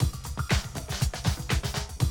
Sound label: Classic Drum Loops